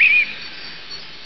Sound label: Mistle Thrush